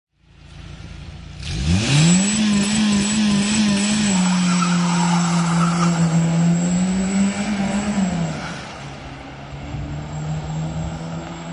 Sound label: Exhaust whistle